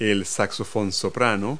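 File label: soprano saxophone; Multi-Lingual Talking Picture Dictionary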